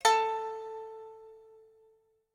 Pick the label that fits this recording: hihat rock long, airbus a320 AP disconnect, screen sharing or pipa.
pipa